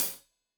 Hat Tight, Hi-Hat, Cymbals; Deeply Sampled Drums

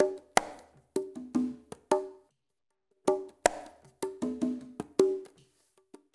CONGAS, BRUSH; Spectrasonics; Liquid Grooves 1 & 2; ICE

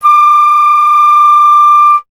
flute D

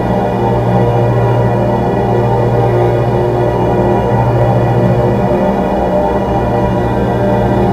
G3 voxy; Northstar - The Wizard VOL-1; VOISH

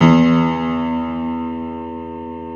E1 R+R PNO; Keith Emerson Signature Series

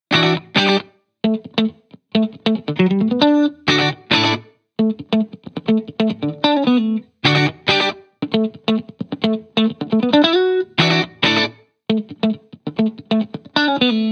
Guitar, 135 bpm, key D, Back to the 70s Guitars; Fox Samples; Full Mixes

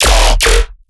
bass hit horse; Virtual Riot

